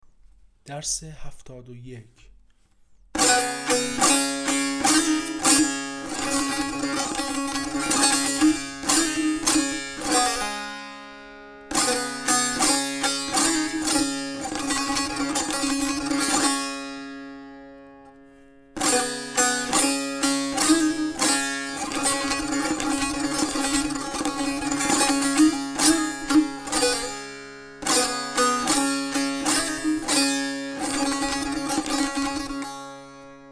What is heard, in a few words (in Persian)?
مشق تنبور